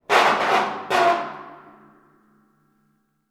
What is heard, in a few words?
Corrugated Iron Sheet; 99Sounds; The Warehouse